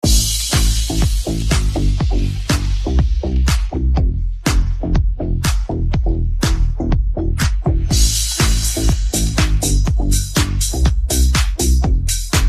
Good Luck Mabel Instrumental

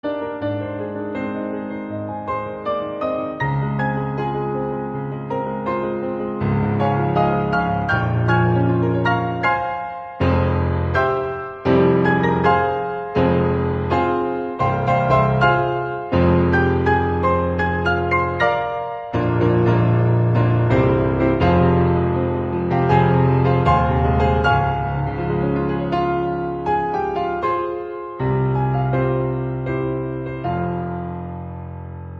Piano Version

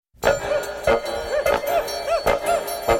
sms; austin powers

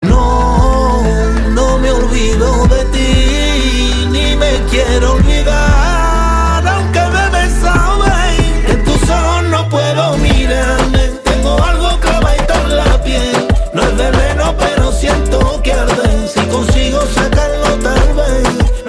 Flamenco

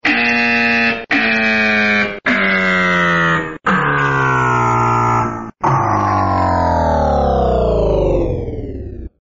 Low Battery; Gojek

